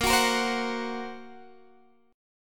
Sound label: A sharp Suspended 2nd Sharp 5th, down, Guitar, Standard; strummed; capo=2